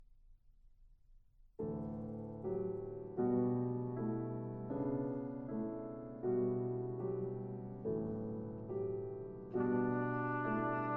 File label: Vocalise, Trumpet Vocalise